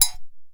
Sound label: BULU SINGLE; Best Service; World Colours